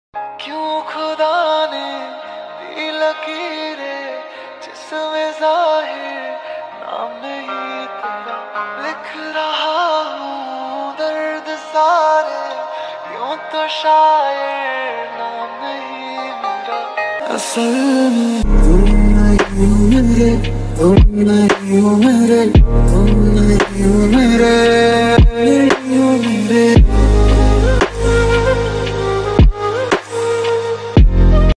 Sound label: hindi ringtone